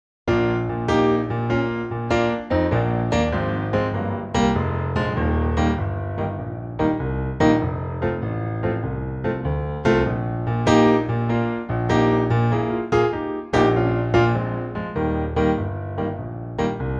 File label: Bb piano